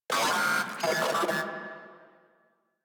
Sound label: Moa Vocal Pain; Enemies; Warframe